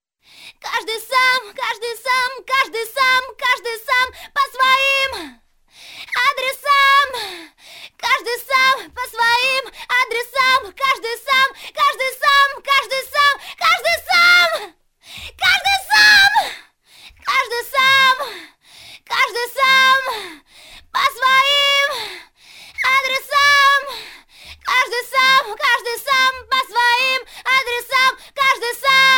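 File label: chorus scream, acapellas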